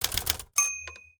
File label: Typewriter; Samsung Galaxy A56; Retro